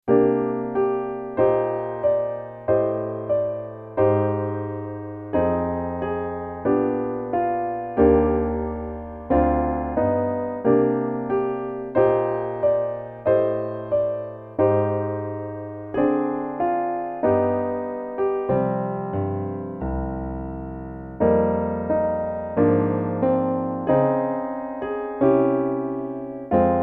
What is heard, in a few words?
solo piano